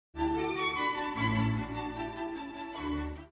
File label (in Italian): Cadenza